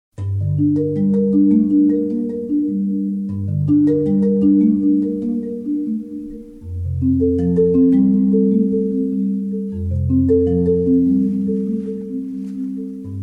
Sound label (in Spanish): Canto